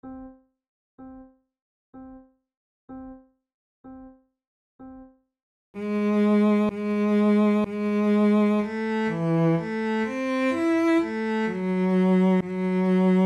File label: recorder sonata in C major largo; marcello op2 no9 iii